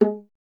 viola, solo instruments

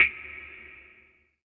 trip hop snare; Trance; Drums; Snares; Trance Explosion Vol1

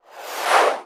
synth ship, Science Fiction; E-MU Producer Series Vol. 3 – Hollywood Sound Effects; Columns

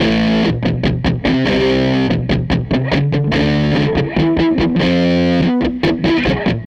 Les Guitar Loop

